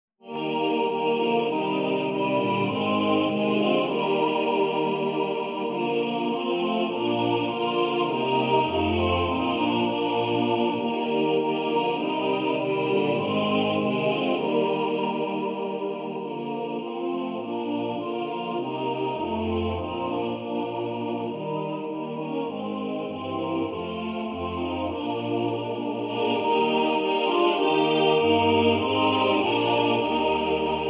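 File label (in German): alle Stimmen gleich gewichtet, Männerchor; Jesus Christus Gottes Sohn; Satz Karl Wezel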